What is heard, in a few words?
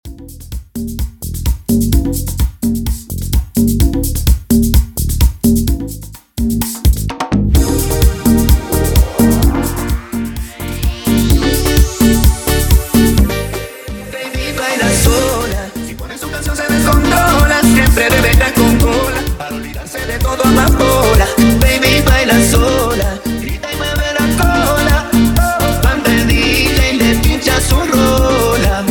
Transition Mambo To Cuambia 128-100BPM; Merengue To Cumbia Transition; Latin genres; DJ Jonathan Salinas; Prodeejay Remix